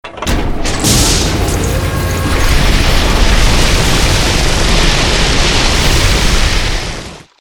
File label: device success